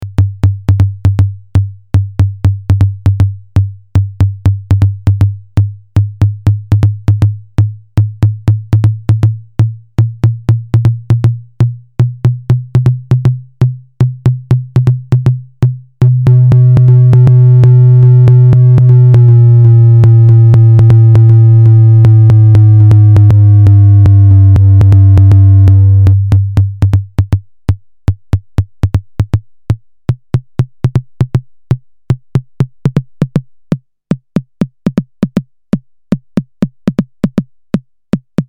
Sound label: TB2 TOM